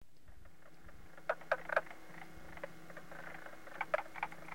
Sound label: mink frog